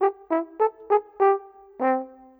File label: Horn, Orchestral; Sonic Foundry (Sony Creative Software); Orchestral VOL-2